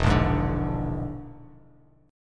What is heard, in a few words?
E 7th Flat 5th, down, Bass Standard; E7b5 strummed; JGuitar